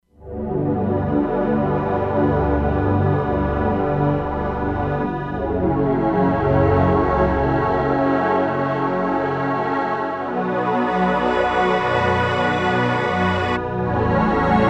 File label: Pad, E-mu Proteus-3; Factory patches; Peter's Pad